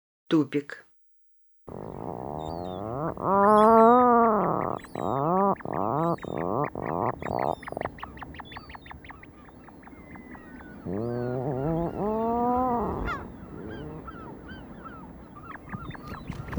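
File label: puffin voice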